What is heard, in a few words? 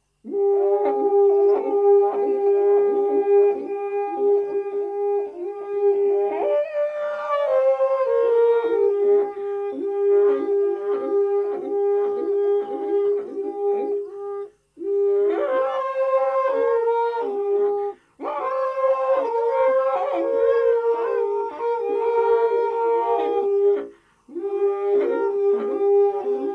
sacred flute